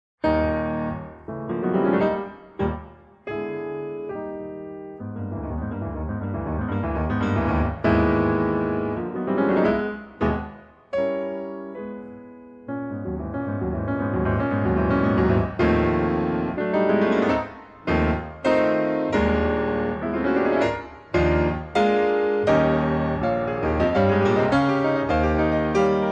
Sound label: Polonaise in A-Flat Major; Op. 53; Heroic